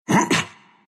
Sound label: pain, ru; dota2